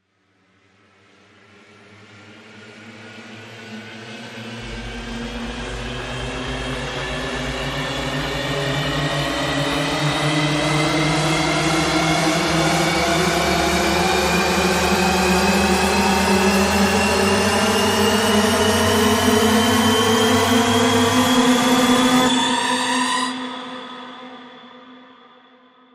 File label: Rise Long